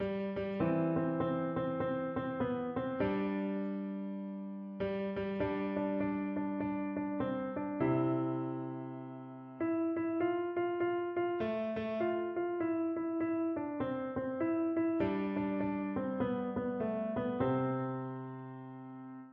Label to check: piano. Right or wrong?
Right